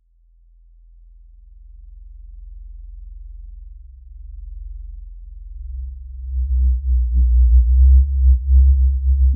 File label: soft rising hum focus sou; meditation